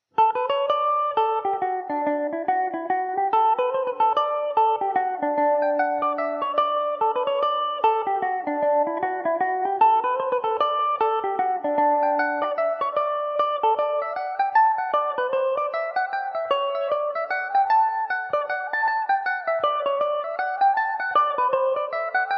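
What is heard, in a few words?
D major revelation, irish mandolin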